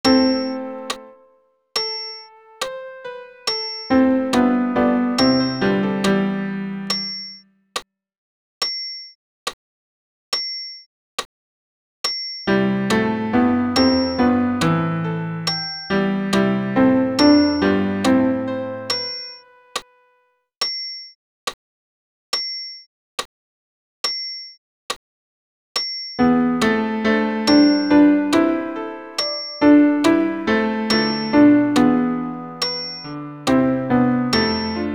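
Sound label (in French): Ite missa est baryton piano accompagnement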